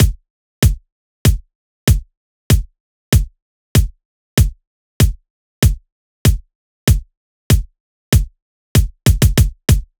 Drum Loop - 96 BPM Kick, Latin; Cymatics; PARADOX II; Drum Loop Collection; Campari

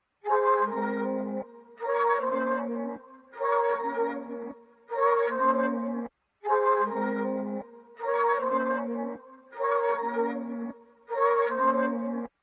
155 BPM F Min Flutes; Loop Stems; Cymatics; 2022 Melody Collection Platinum Expansion; Warped

